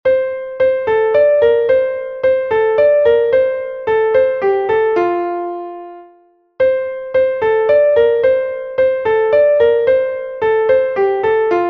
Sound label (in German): kommet ihr hirten, klavier